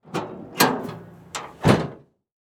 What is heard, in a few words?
Truck Ford Ranger Open Tailgate; transportation